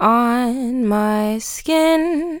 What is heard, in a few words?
On My Skin - 70BPM, Female Vocals, Dry; Advent Calendar 2017 Day 1